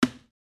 Bucket Hit

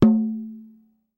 Hand Frame Drum

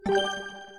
Menu Validate R

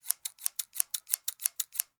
Scissors Cut

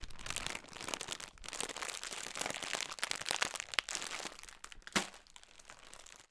Opening Packet

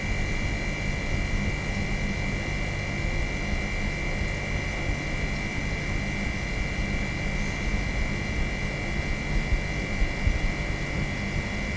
Fridge Hum Electric Noise

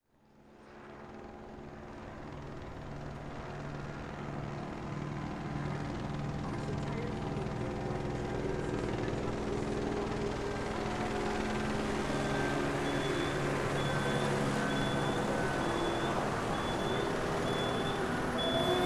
Ambient City Sounds At Night

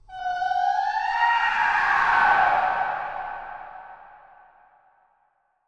Falsetto Scream

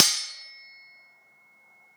Sword Clash

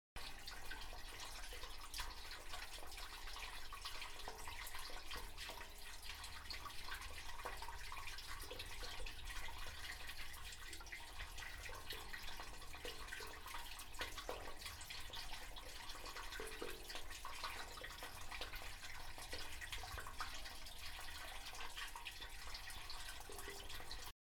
Water Pipe

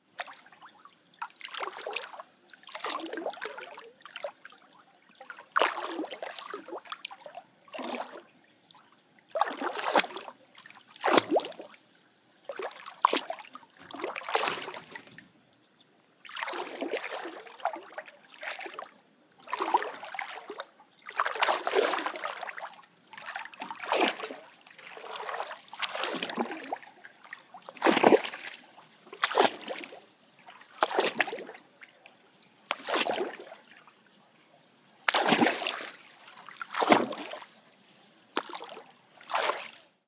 Water Splashes And Waves Outside

